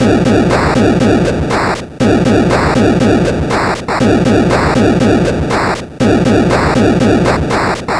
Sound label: Atari 2600 Noise Drums